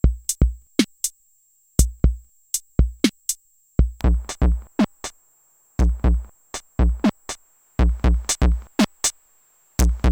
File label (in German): Drums mit Effekten Distortion